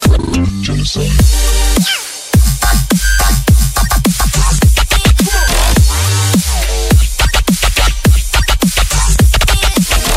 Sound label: dub step ringtone